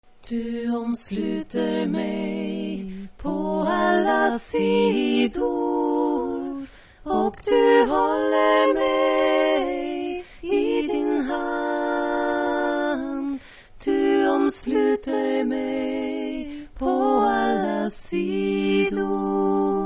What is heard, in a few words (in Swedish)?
alla sidor tenor